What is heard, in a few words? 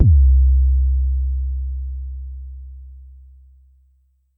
Tempest 808 Style Kick